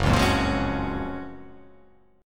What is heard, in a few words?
F sharp Major 9th